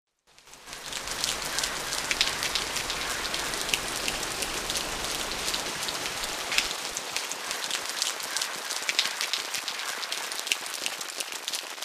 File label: Rain Change